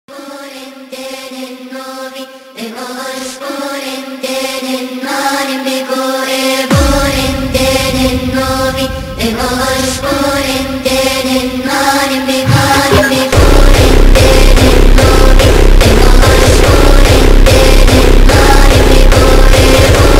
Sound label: Phonk